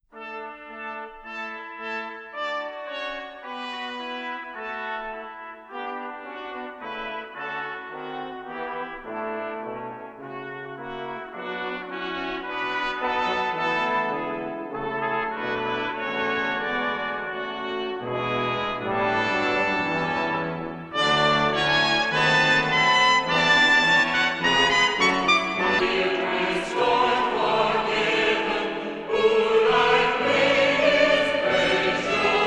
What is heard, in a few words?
Strings Orchestra Choir